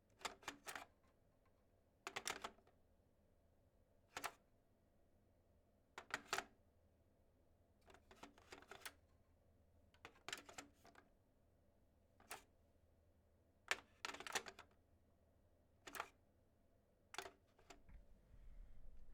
Old Telephone Picked Up And Hung Up

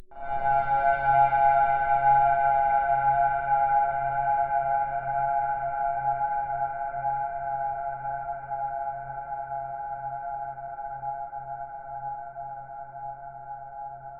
Ambient One Shot

